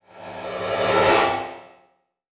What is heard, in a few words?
Multimedia Internet CD-Rom Flash Whoosh